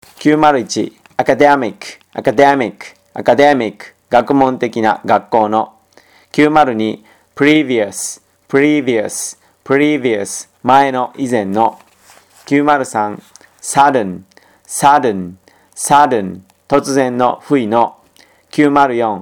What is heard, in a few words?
tango